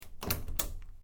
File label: Door Factory Metal Sliding Unlock, Industrial doors